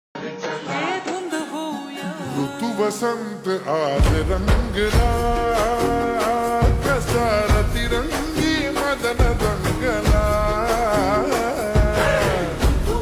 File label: Slowed Reverb